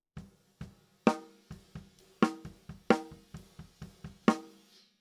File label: Snare Top